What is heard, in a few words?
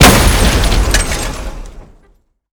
BOSS generic Impact; Bittrip